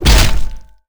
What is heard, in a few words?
impact, generic large; weapons; props dane